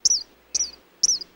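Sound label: Eastern Kingbird Call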